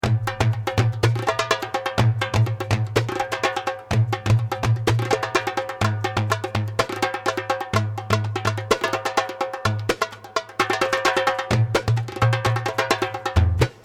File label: Duet